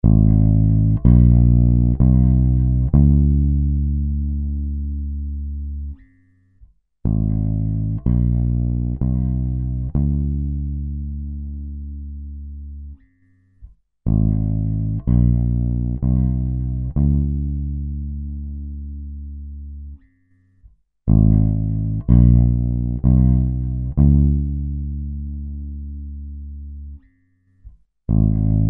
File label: bass